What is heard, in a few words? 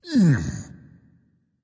grunt lift; smite; voicepack; xingtian